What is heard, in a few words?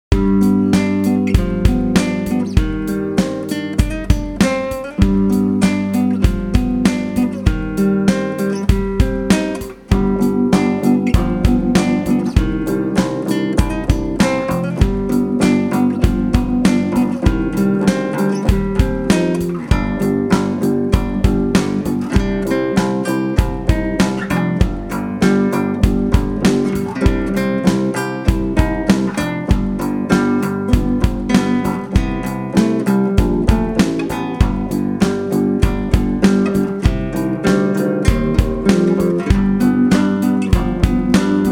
Bass